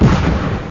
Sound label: tank; TankDefender